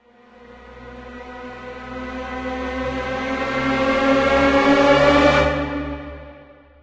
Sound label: Build up; friday the 13th killer puzzle